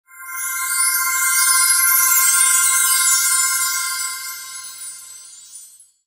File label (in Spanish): Sonido Magia; Sounddesigner